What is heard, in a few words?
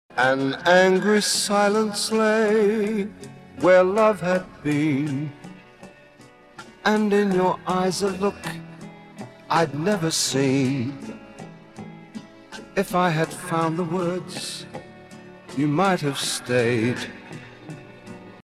Vocal